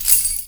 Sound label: snare